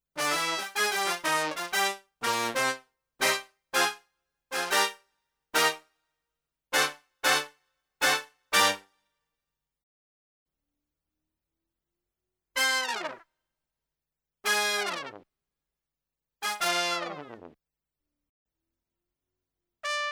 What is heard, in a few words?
SD1000 Brass